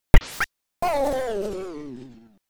Buzz Buzz die; mother2

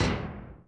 Naming screen type; mother2

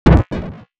Teleport crash; mother2

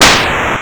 fire; mother2

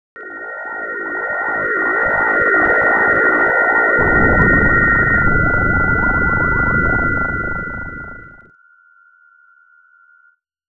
Teleportation; mother2

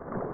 Deep water; mother2

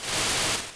spitting attacks; mother2; Giygas

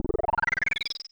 Stat increase; mother2

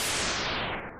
Thunder miss; mother2

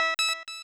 button; mother2; Sphinx

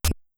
Hit; mother1

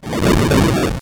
Fire; mother2

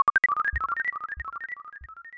Scattered spores; mother2

